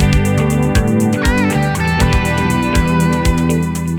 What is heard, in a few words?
Classic Pop, ringtones; Google; NewWave Labs